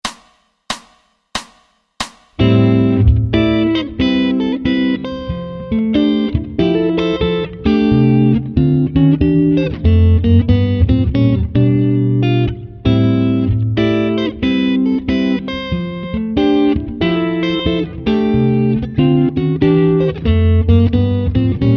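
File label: Rhythm Guitar Chord Melody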